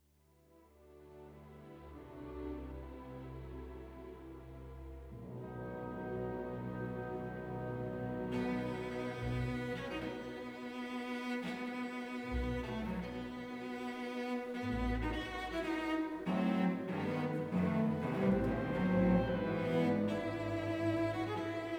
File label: cello concerto 1st movement; Dvorak